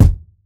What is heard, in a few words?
kick drum single shot F key; drum single hits one shots; kick drum samples